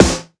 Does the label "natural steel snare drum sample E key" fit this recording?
Yes